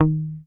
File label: HOFNER MUTE, Bass